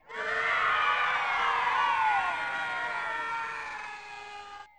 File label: yay